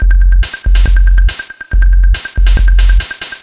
Cheepo Groove 140, Lounge Drumz

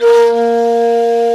Nye Flute A#2; Planet Earth; Digital Sound Factory Planet Earth

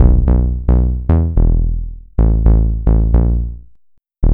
Bass Line; Eclectic Electronica

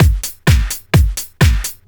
Clubhouse Loop 128 BPM

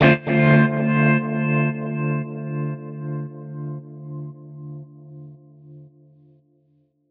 Guitar Chords D; Zero-G - Future Wave

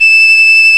E6 FLUTE; ORCH.COMP

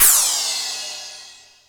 cymbal, AKAI XE-8; Greytsounds

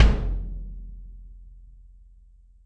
Kick Shard, Processed Drumkits; Sonic Foundry; Zero Gravity Beats; Shards

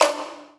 COL LEG B3, STRINGED; East West; Global Sounds

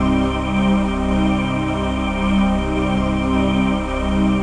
Pad

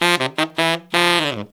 160 BPM, Horns; 90 s Sample CDs; Zero-G; Phantom Horns

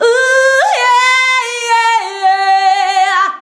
VOX, Singin

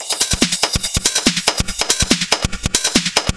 Beat Crush 142bpm, uk garage

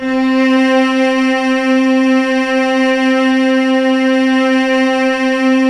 SAD CELLO, JV-1080; Syntec; Wall of Sounds VOL-2; ORCHESTR-EXP

